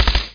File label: MP5sd 3 shot; 11,025 sample rate; 0.05 seconds; SpecOps2